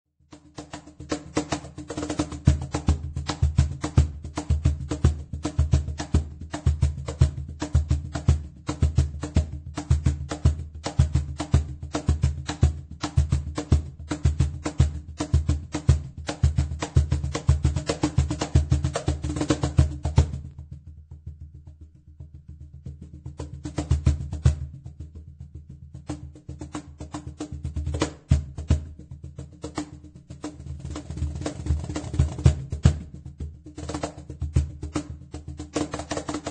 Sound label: cajon